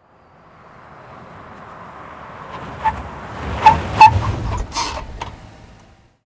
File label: Chevy Silverado, Transportation, Automobile, Truck, Pull, Up, Anti, Lock, Brakes, Screech; Chevy, Silverado, 2010